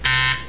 Buz; Wheel Of Fortune

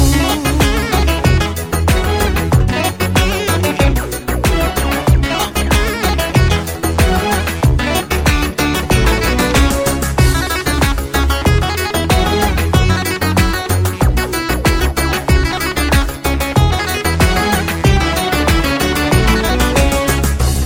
Balkan Turbo Folk

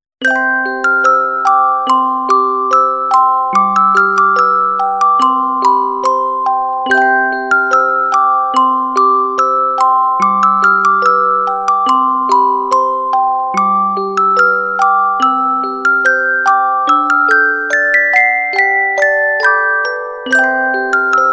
Deck The Halls

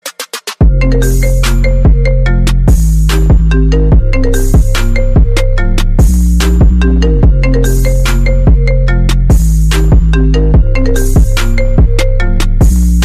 Iphone Ringtone; iPhone 8-8

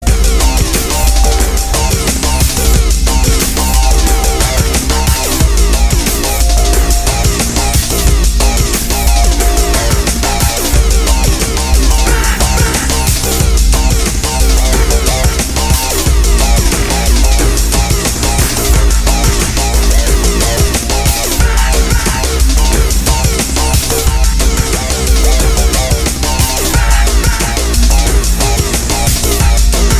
Hybrid Tension

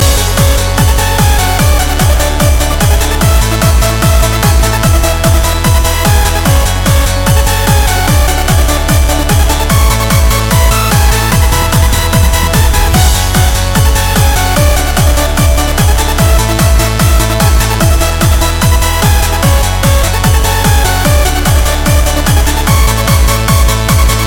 Trance

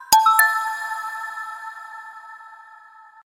Metal xylophone

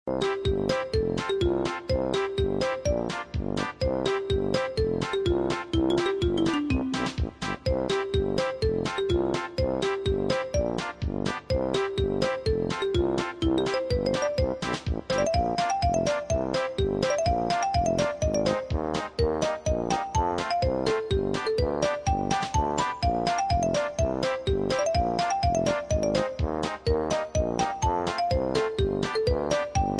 Happy; Banjo Tooie

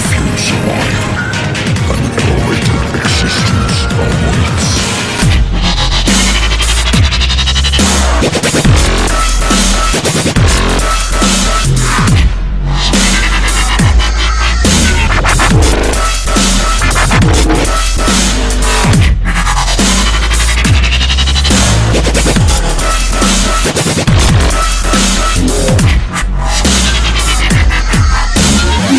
Dubstep Transformers

